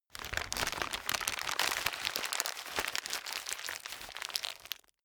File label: paper wrapping crumple